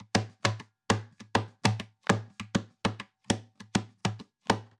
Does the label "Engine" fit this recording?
No